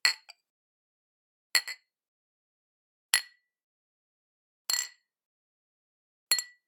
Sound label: Glass Clink; Kvv Audio